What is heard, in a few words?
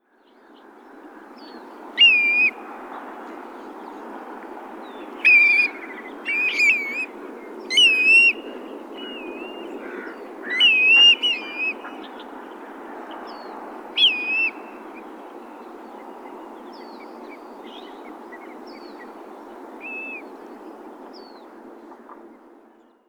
Grey Plover Pluvialis squatarola; birds